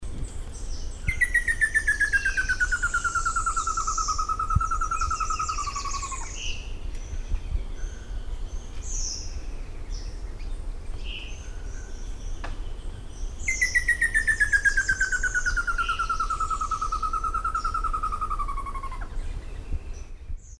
Merulaxis ater; Jaguareg; Bertioga; SP; Silveira

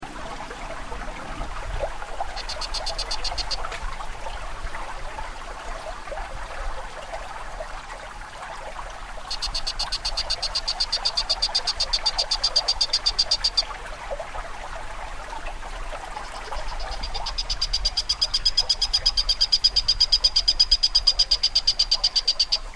Crossodactylus caramaschii; PE Carlos Botelho; SP

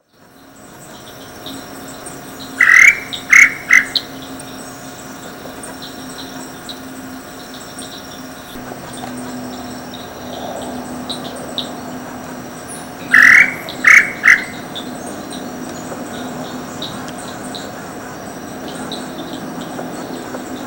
Crypturellus tataupa; Picinguaba; Ubatuba; SP; Brazil

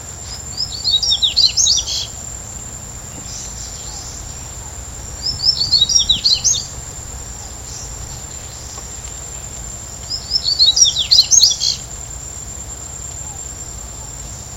Sporophila nigricollis golf; I Comandatuba BA; Silveira

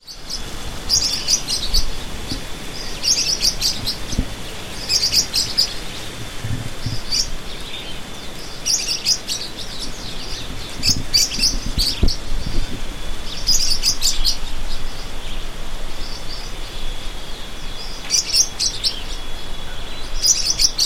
Forpus xanthopterygius; PE Intervales SP

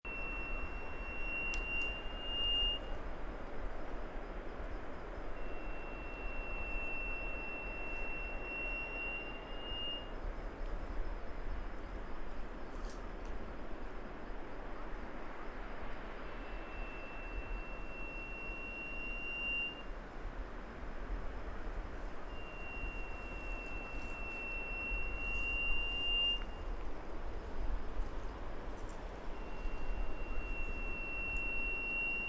Tijuca atra; N Cunha; SP; Brazil Silveira

